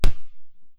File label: bounce, dodgeball